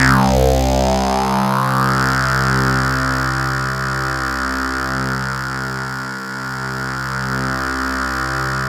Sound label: THE BUZZ, KURZWEIL K2000